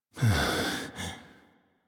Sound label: We All Fall Down Alt Breaths; Arthur; Warframe; NineteenNinetyNine; Stage12PartyOfALifetime